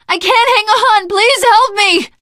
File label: ledge hang middle, teengirl; source engine; left 4 dead 2